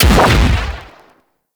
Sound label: Pistol Explosive Impact